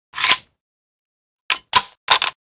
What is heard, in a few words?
sten Reload; Modern Weapons Pack